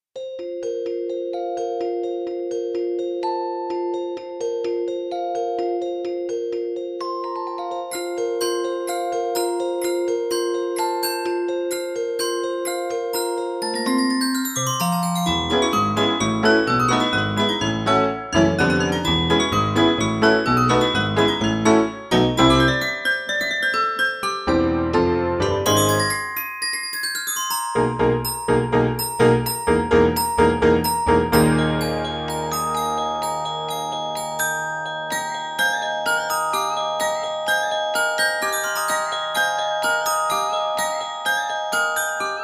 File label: celeste; Pianoteq 8